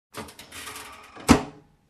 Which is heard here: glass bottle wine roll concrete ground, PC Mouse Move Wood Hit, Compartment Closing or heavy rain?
Compartment Closing